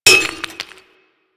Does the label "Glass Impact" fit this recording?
Yes